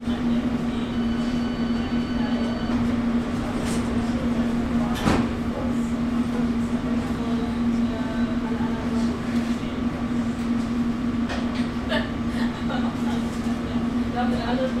transportation london underground train idle at station people